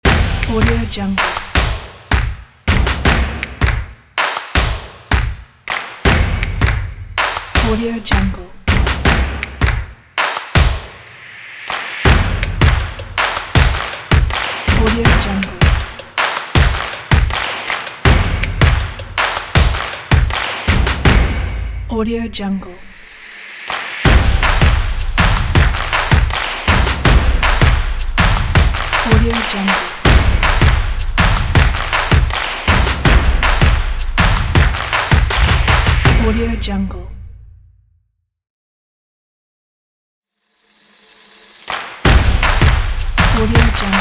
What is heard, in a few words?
percussion stomps claps